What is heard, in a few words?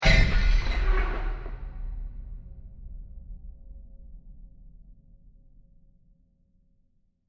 Various Wood And Metal Elements And Scratches; Bursts; Crafted Signals